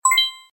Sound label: Mail Tone; MGS 5